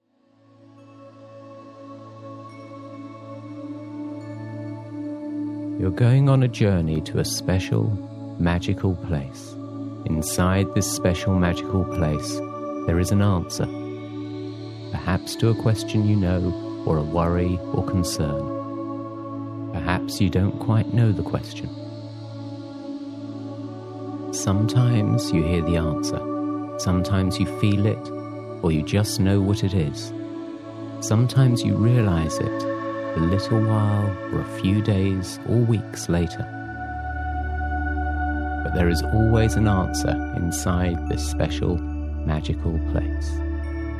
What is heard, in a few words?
meditation nights angel waterfall; thecrystalhealer